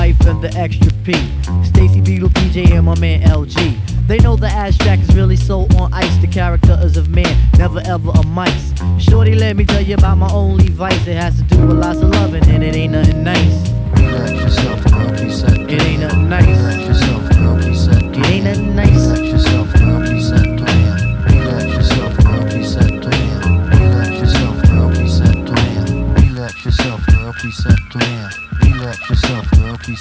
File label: hiphop